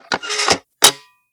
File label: GRANATOMET RELOAD